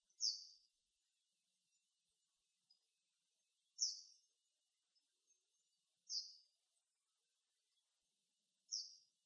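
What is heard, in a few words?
segurosekirei call, Japanese wagtail; jinaki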